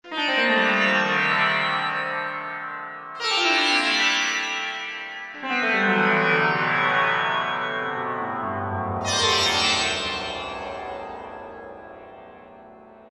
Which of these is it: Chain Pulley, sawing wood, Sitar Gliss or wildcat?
Sitar Gliss